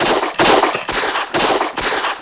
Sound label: INFANTRY; PARADISE